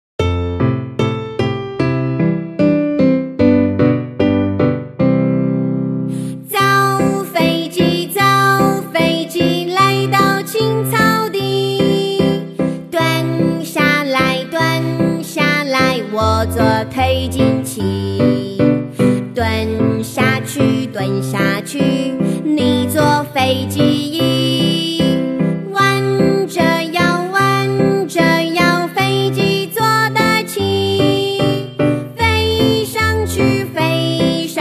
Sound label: sing; 造飛機